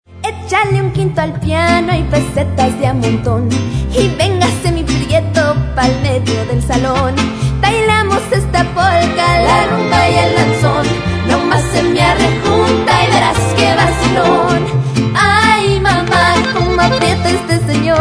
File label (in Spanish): Piano con mariachi